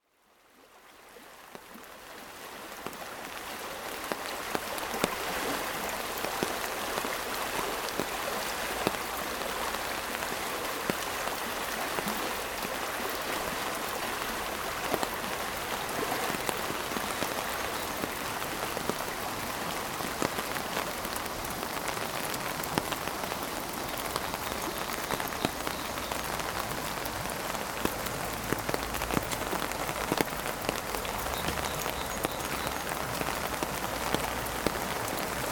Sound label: Swiss Valley Soundscape